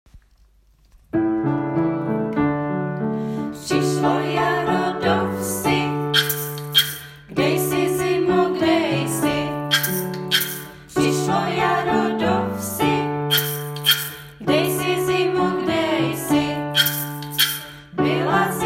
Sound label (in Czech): prislo jaro do vsi zpev; Puntika a Pepiny